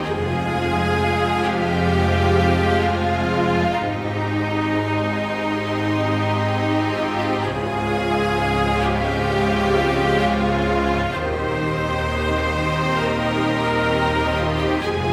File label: Nether Level loop; Rezrog; Depard; Gems of Rezrog